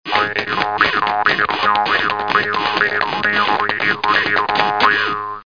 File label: rocking